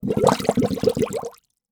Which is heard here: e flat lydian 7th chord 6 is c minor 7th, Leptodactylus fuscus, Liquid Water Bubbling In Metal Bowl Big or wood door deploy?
Liquid Water Bubbling In Metal Bowl Big